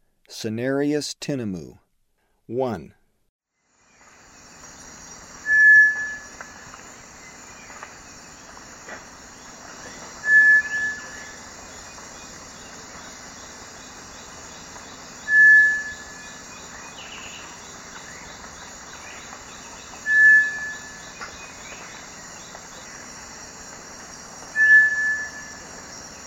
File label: Cinereous Tinamou; S. America; Eastern Lowlands